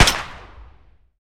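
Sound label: weapon pistol 10mm fire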